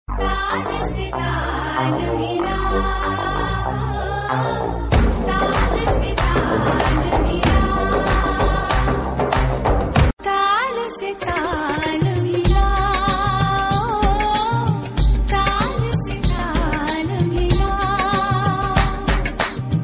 Taal Combined

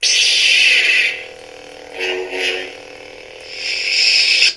Lightsaber; Vader

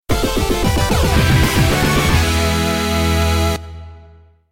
Stage Clear

